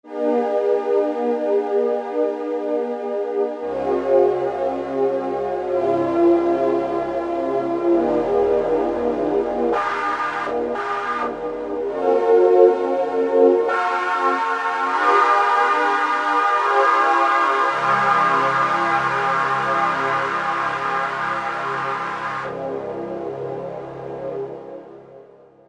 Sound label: Banded Jupe, Roland SR-JV80-11 Techno Collection